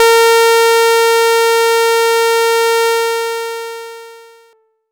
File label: A Pulse Lead